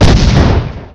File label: explosion; Crazy Machines; Играет вся семья